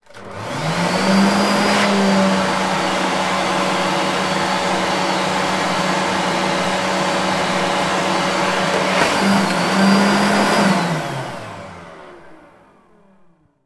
household hairdryer wall mounted on run off short

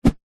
metal crowbar swing in air whoosh; foley

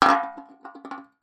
foley can beer empty down table wobble; zapsplat

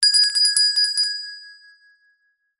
bell small hand ring short

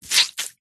cleaning cloth soaking wet squeeze squelch; household

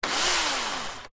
industrial chainsaw electric short burst air

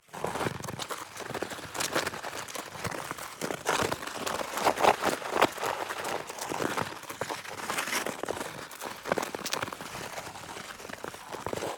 ICE Skate glide

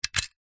staple gun metal mechanism loader click; office

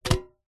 foley tin metal cylinder lid on catch; zapsplat

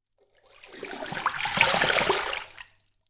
water pour sink from container

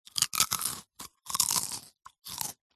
human eat crunch potato chip mouth open

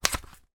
foley notepad page turn single

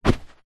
footstep single foot in socks on carpet; zapsplat

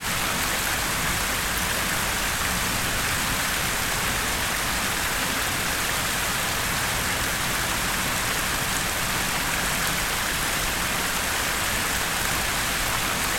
ambience town square fountain

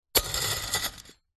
metal scrapes and digs into small stones

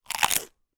human eat bite rice cracker

